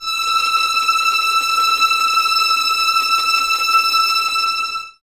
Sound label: VS E 6 Tremolo, 11 Violins; Miroslav Vitous